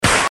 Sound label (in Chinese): 拔河 Snare; 音MAD; 蓝蓝路